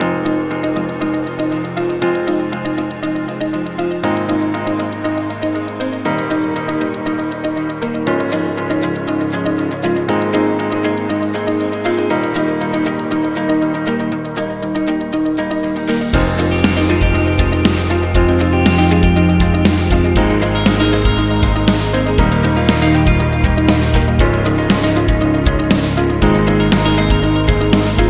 Motivational Rock

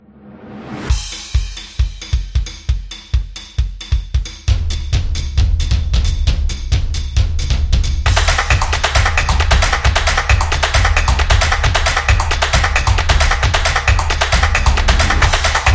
Live Stream

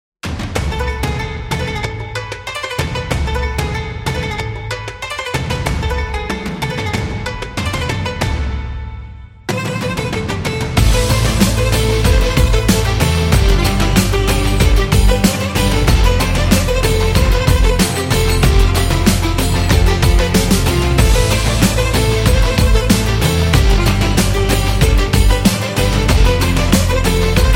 Oriental

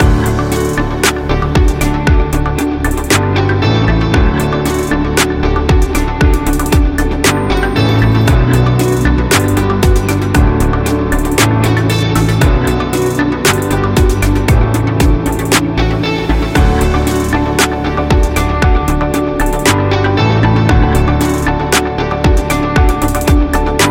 Information Loop; service business

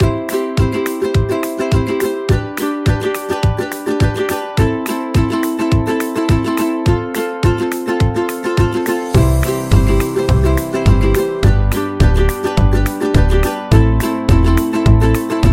Upbeat Food Vlog

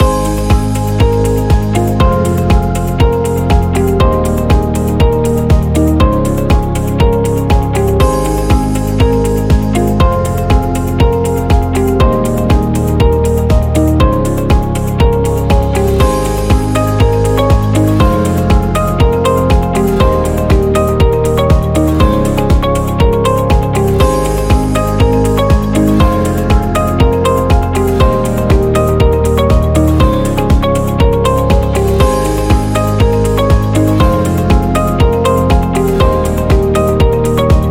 Corporate Loop

